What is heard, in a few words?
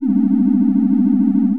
sci fi; Masterbits - Switch FX